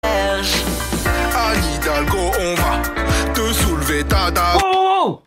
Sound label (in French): le rire jaune; anne hidalgo